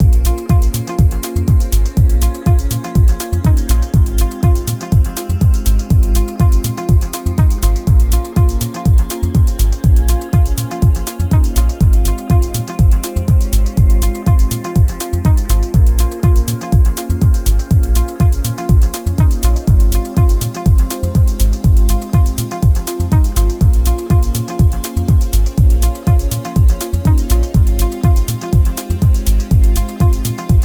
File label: 122BPM Amin